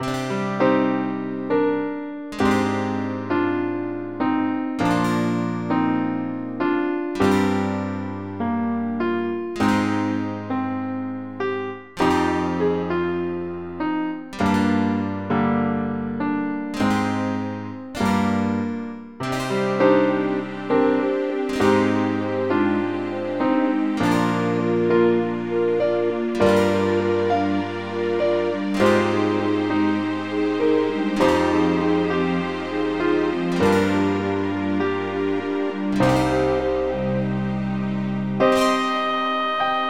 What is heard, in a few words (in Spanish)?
música